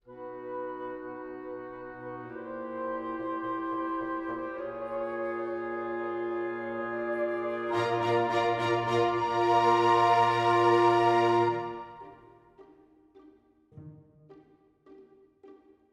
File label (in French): orchestre audio avec voix; orchestra e voci sintetiche